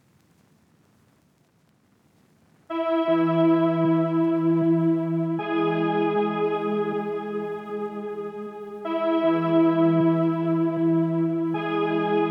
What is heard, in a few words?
Melody Loop - 156 BPM C# Min Synth; Melodies; Loop Stems; Cymatics; Oracle Sample Pack